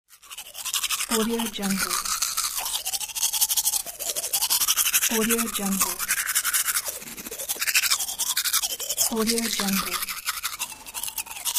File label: brushing teeth